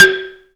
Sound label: ZYLIMBA; Greytsounds VOL-1